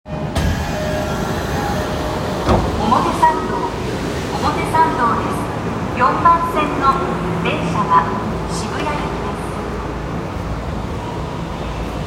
銀座線表参道西, train sounds

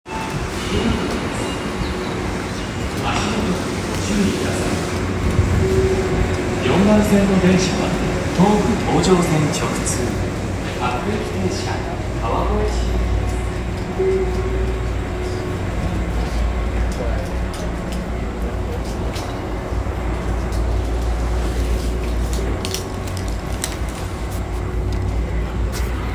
有楽町線池袋西, train sounds

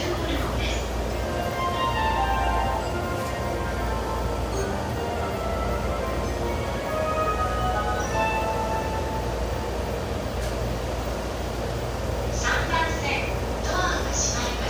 JR湘南新宿線池袋北, train sounds

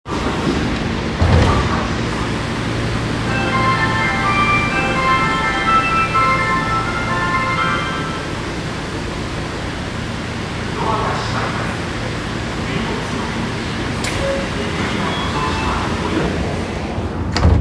有楽町線麹町西, train sounds

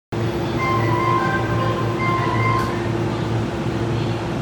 埼京線北与野北, train sounds

